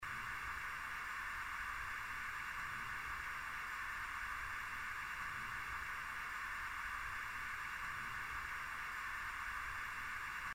コンロの火

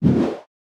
剣を振る; 武器効果音

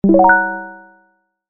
不気味・不安な音 ベル

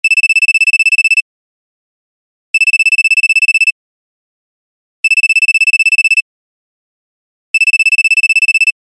着信音

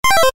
キャンセル レトロ

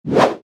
剣を振る; 武器効果音

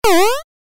ジャンプ レトロ

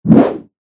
槍 空振り; モノラル; 武器効果音

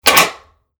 鍵を開ける

